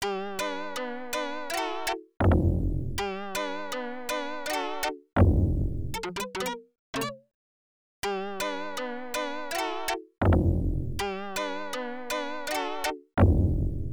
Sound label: Startup; kax iii; pc 9801